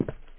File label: footstep; fear